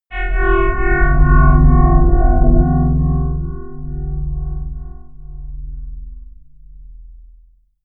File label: melodic odd flutter bell